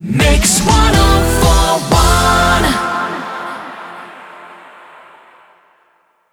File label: 122bpm a mix rapid fire; WBMX